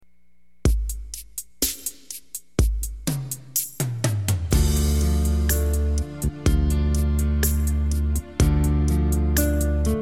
slow techno; yamaha

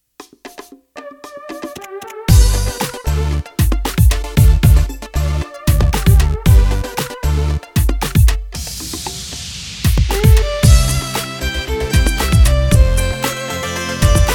hiphop; genos

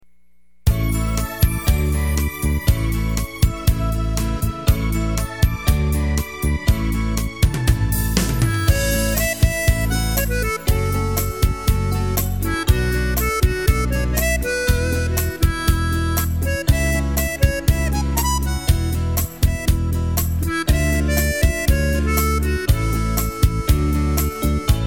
bebop; yamaha

